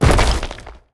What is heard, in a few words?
Jump